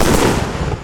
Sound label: Fireworks Thunder